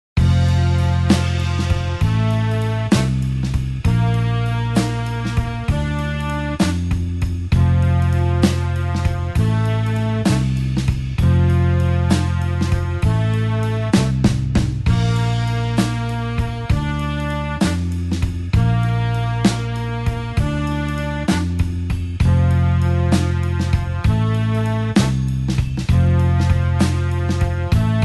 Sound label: blues horns slow F